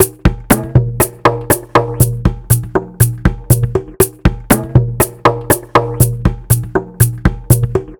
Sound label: Ethnic Drum Loop; Sonic Foundry (Sony Creative Software); The Electronic Music Manuscript Collection